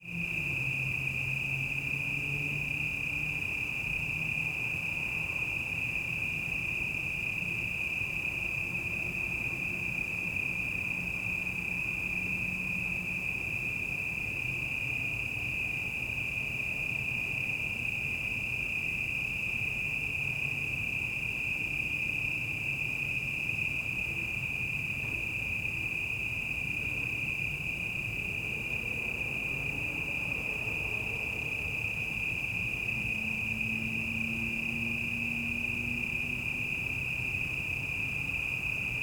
City Night Evening Ambience, Quieter, Cicadas, Distant Traffic; Surround; 5.1 LCRLsRsLf; 2496SoundEffects; Surround in The Night 5.1- Stereo